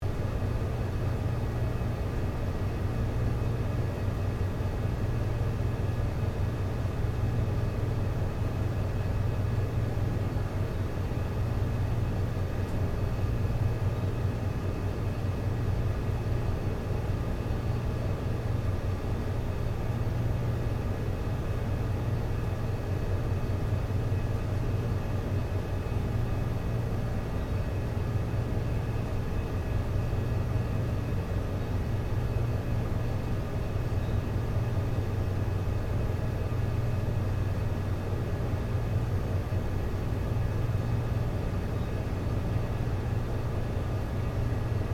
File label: Room Tone Kitchen, Ambience, Restaurant, Empty, Loop; Room Tone Sound Effects; BlastWave